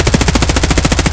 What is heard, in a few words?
hk416 outdoor distant loop; Escape from Tarkov